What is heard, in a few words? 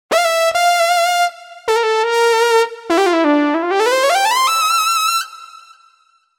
Osc Lead; TURBO VL